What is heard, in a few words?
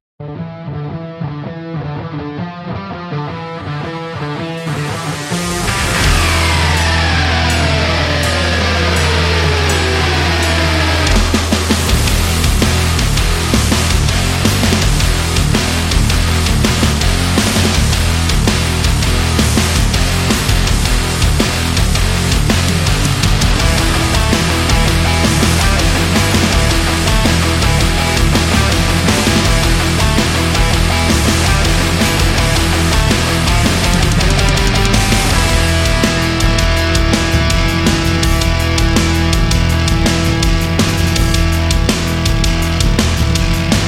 Action Metal Drive; action metal and rock music